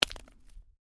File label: Ice: small chunk of ice lands on hard surface; Snow Sound Effects; Stuart Duffield